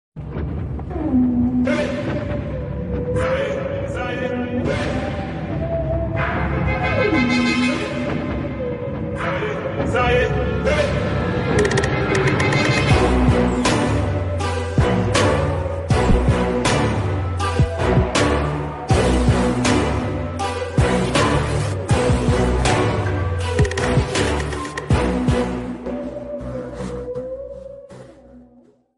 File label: Telugu Movie Villain Bgm